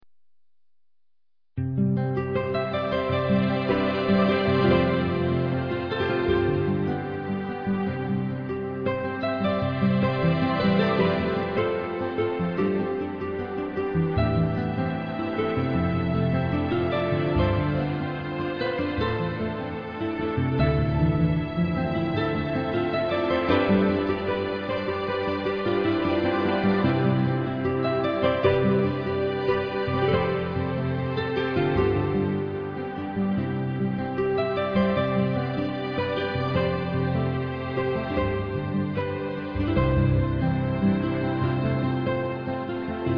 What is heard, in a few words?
harp